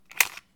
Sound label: Gun Pickup